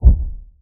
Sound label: bass huge, weapons; JSRS Sound Mod